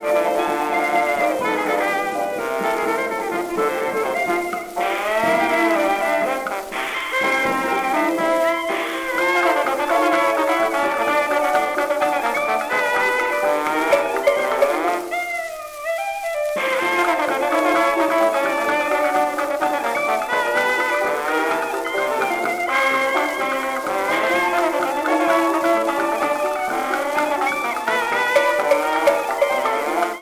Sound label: jukebox